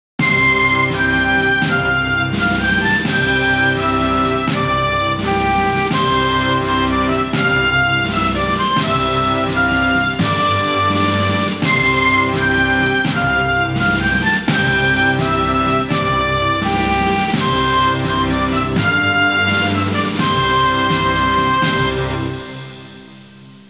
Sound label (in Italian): musica sinfonica; symphonic